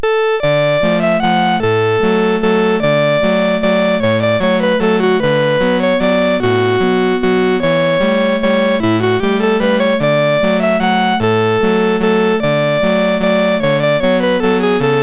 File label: Waltz, MOS6581R3; Eugene Onegin